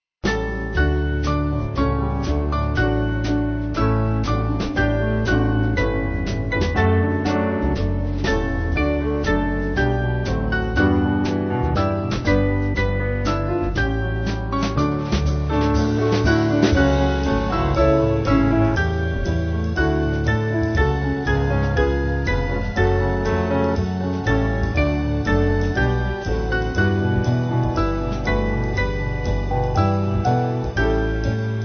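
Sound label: Band, Music Backing; In Heavenly Love; Mendelsohhn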